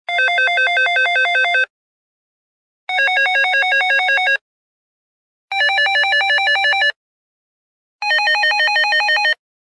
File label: Stairs; Basic